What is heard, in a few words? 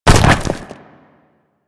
Colossus Hand D; Warframe; Colossus Boss